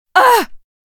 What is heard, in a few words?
HURT PAIN A FEMALE; combatarms